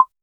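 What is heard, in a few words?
soft tap sound gentle click, touch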